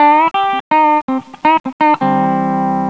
Pedal Steel C; Sonic Foundry; Crimson Blue and Fabulous Horncraft 4 RnB; ClassicCountry

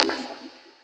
Liquid Drop; M8; Electronisounds; Enigma Dub Cube One Shots; PERC

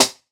Snare, jungle breaks drum sounds

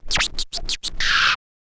scratch kit, vinyl

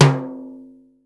timbale lo, Yamaha Motif ES drum machine; 300 Drum Machines; Yamaha Motif ES-Series

